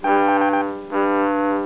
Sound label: radar